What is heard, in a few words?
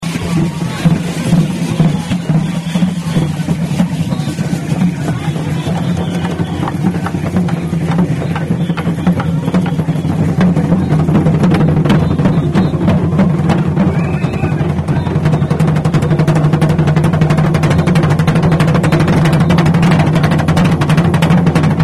aztec drummers